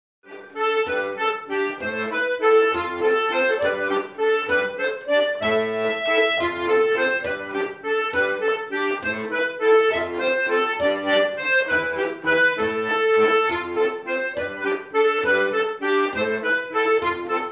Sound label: Bourree; Rondeau 2021; Sul camin de La Guiola